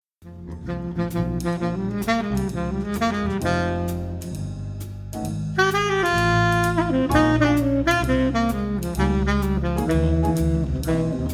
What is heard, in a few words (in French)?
extrait blues; Sunday Blues